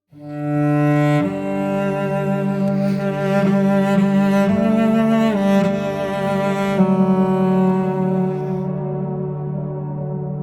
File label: ambient mix; meditation